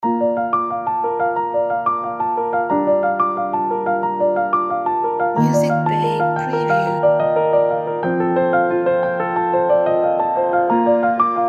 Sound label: Cinematic Piano Inspiration